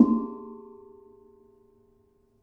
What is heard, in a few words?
Ketuk hard, gamelan; gravityharps; timbrebox